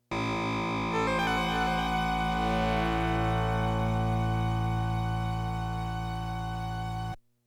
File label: Tinkle of Rings; Jewel Master; The 16bit Audiophile Project; Genesis